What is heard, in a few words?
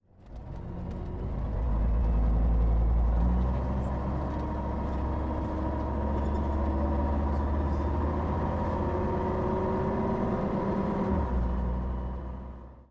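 dennis dominator, bus; Simutrans; Pak128.Britain-Ex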